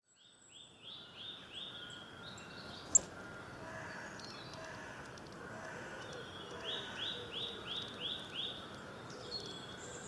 Canto de Aves; Videos y Película Para Gatos

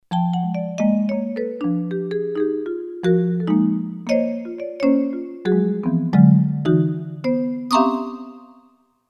Xilofono

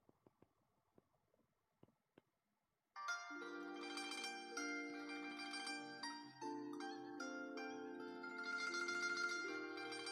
música china

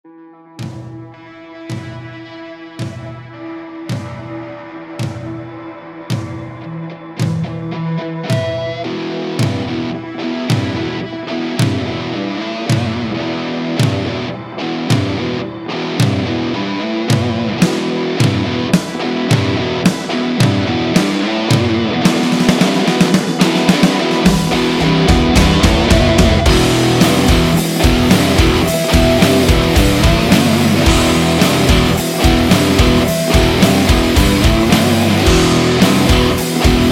Música de Fondo Para Videos Dramatica Epica Trailer

